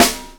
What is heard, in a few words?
DRUMKIT S; Keith Emerson Signature Series